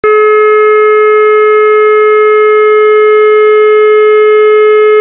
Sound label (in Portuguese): CONTINUO, sirene; sirene orbe